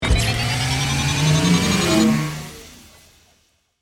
Power Up High Tech, Power, Up, High, Tech, Digital, Ascend; Production, Element, Imaging, Accent, Transition; BlastWave